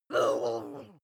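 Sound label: witch groan short, creatures; kreaturen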